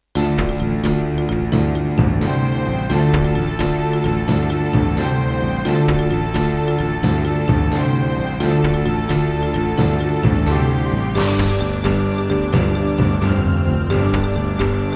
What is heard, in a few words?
Trust Korg Super Sound Module, wavetable; Heretic